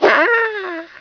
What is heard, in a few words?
attack; svencoop; hunger; hungercrab